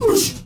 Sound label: MALE WAR, SHOUT